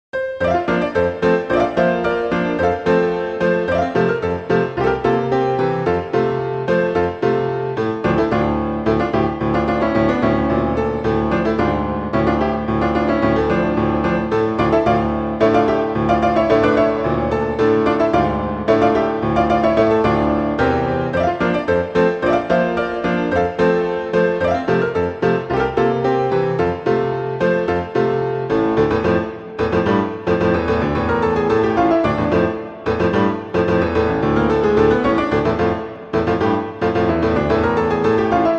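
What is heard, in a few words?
Allegretto, violin; Brahms; Hungarian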